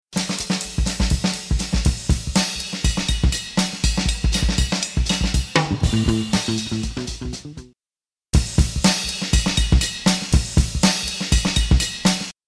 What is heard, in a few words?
Beat